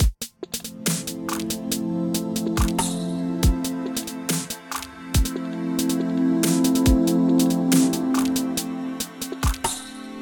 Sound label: Middle Loop; Mixolumia; Tessellation